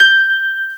grand piano G5; Star Trek JR Audio

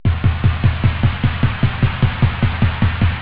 attack, drums snare; OctaMED SoundStudio V1

